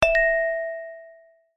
multimedia alert notification ui ping chime mallet like positive